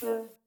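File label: Vocal Shaker; 300 Drum Machines; Yamaha DTXpress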